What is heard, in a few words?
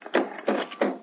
clank